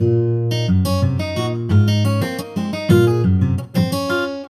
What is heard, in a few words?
Ample Guitar